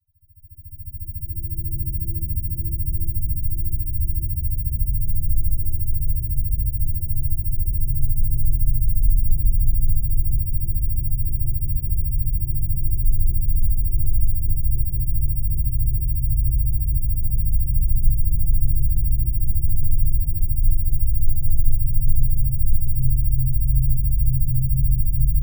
Binaural and Music; Immune System Boost

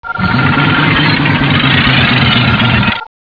SLOTSPIN

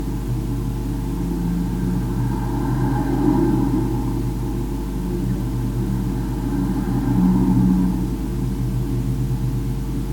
car; Cumbria Remixed Sample Pack

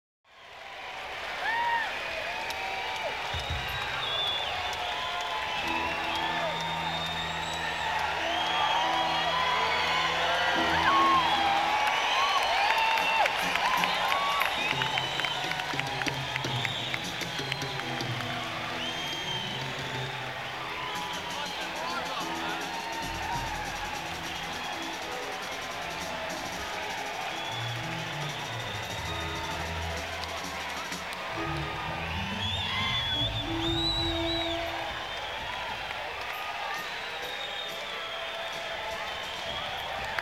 Crowd Tuning; senn421

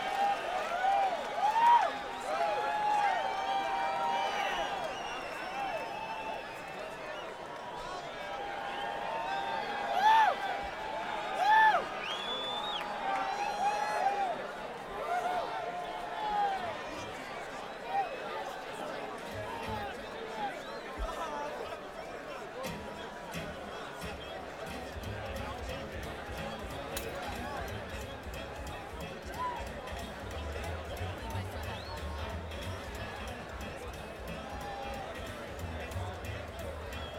Crowd; schoeps cmc3 mk2

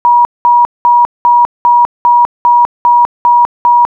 960Hz -3dBFS 10bursts

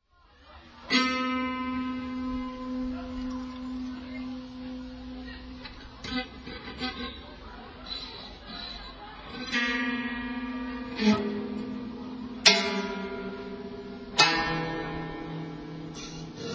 Piano And Objects

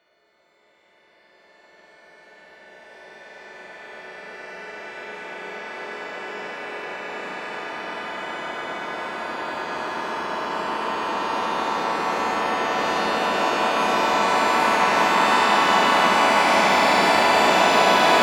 Clock

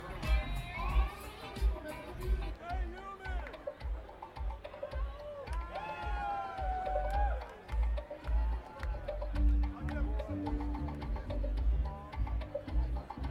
Set Intro

